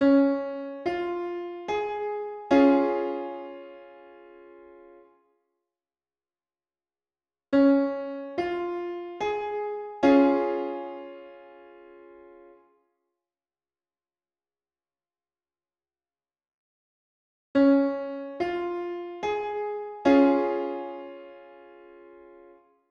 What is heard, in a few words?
Triad Audio